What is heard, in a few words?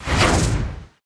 Whoosh